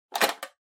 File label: Pencil Case Shake